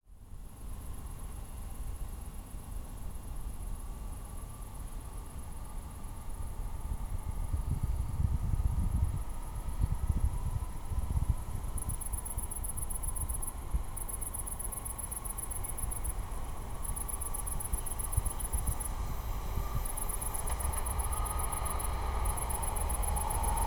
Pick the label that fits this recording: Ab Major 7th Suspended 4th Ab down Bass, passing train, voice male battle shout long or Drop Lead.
passing train